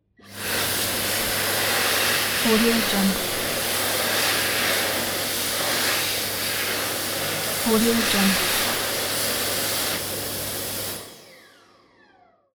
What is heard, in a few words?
hand dryer